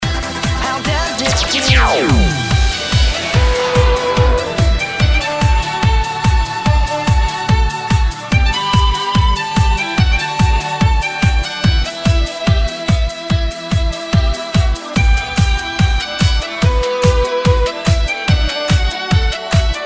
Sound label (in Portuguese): electro funk e electro dance remix